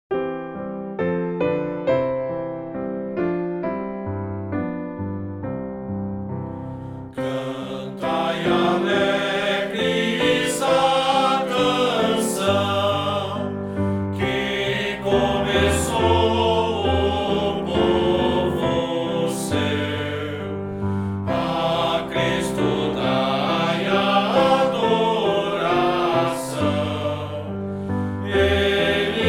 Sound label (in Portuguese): voz piano